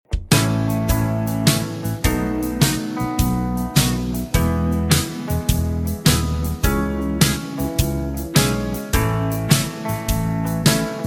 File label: (E) No Backing Vocals